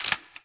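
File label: button click; slotsfruitex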